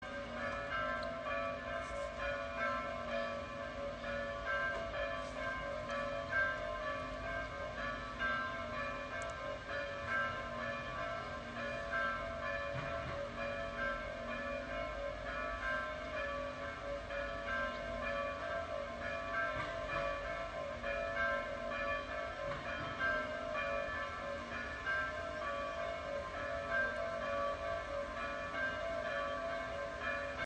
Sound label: san rocco bells